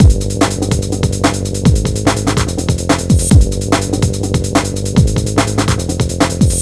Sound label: Hardcore Breakbeat, 145 Gmin; Big Fish Audio; Hardcore Breakbeat Action